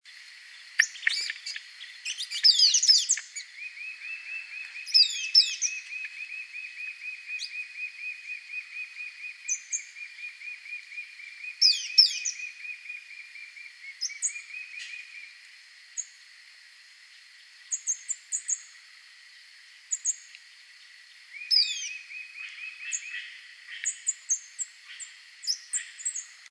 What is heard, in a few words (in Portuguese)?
canto da saira apunhalada